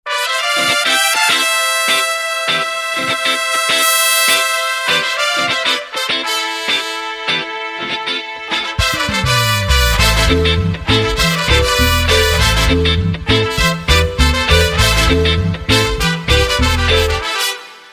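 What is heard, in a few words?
background music Ringtone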